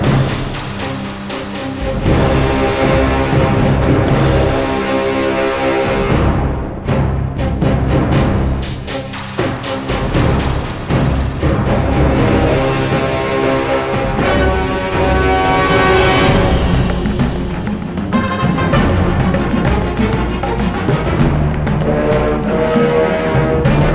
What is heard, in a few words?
High Combat; Star Wars The Clone Wars Republic Heroes; wii